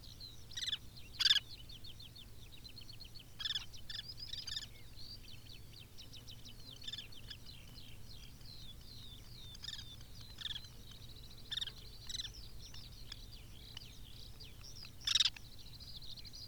Collared Pratincole Glareola pratincola pratincola, collared pratincole call